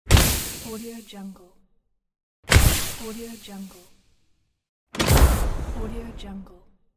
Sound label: gas bomb explode